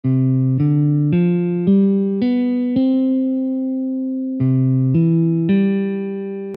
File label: scale; scales of India